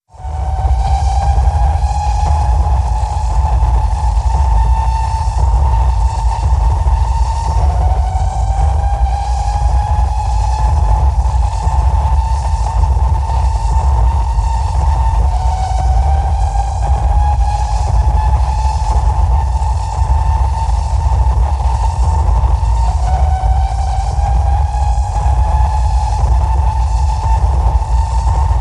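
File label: Timekeeper Monster Attack 120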